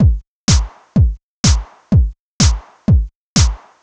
drum loop fortune stripped 125, Classic Chicago House; Sample Magic; Drum Loops